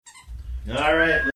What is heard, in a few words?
sound of squeaky toy someone saying alright microphone feedback